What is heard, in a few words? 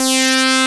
SYN, analog; Sound & Vision - Gigapack I CD 2 (Roland)